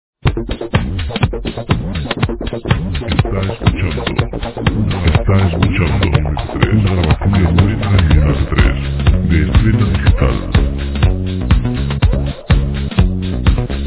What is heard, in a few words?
simple 125 disco; dance